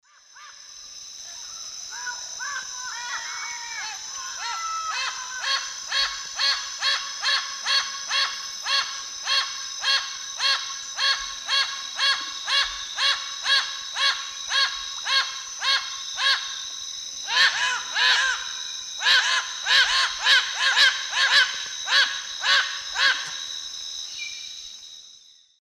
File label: Amazona festiva, calls; S. America; Eastern Lowlands